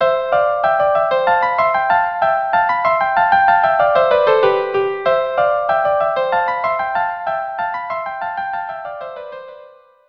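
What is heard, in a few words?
Gavotte Allemande violin duo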